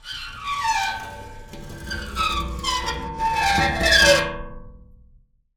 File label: Pane Of Glass Rub